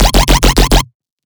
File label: Riddim Bass Hit F; One-Shots; Bass Hits; Quack; Ghosthack; Riddim Essentials Volume 2